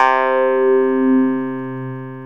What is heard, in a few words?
WAH CLAV C4, clavinet; Zero-G; Funky Elements